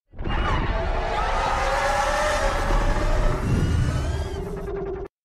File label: roar volcano; rodan 2019